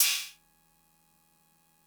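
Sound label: cymbal; MFB-522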